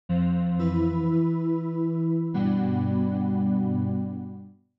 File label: gameover; YokaiSurvive